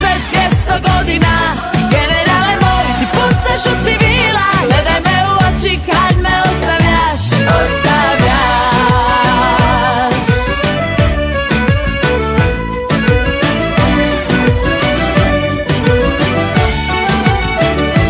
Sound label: female croatian song; ringtone